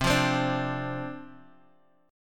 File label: D-Minor 7th C, chord